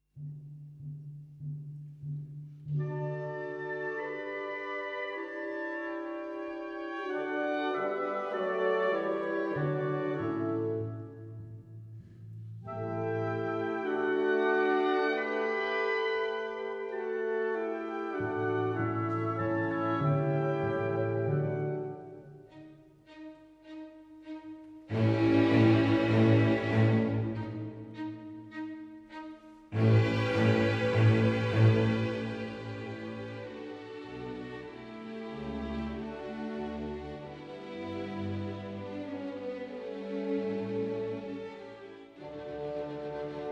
Violin Concerto; Beethoven; Sondeckis

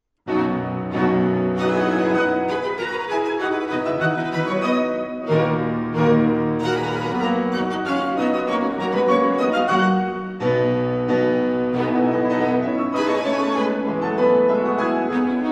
Piano Quintet; Gernsheim

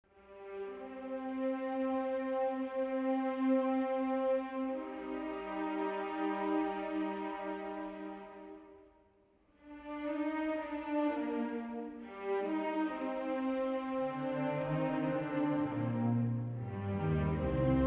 Strings; Werner Pirchner; Sondeckis

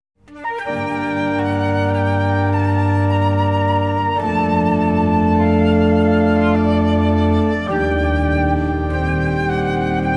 Ciaccona for Flute and Organ; Vitali

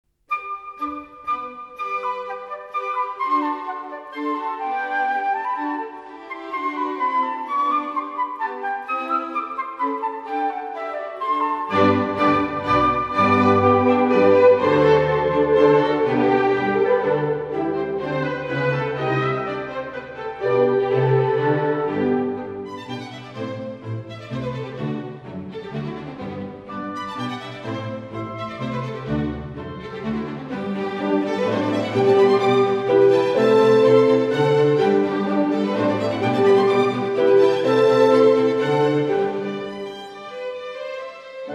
Flute Concerto No1 Rondo; Mozart

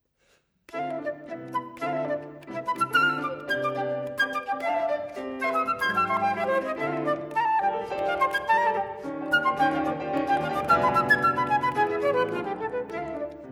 Sonata for flute and piano

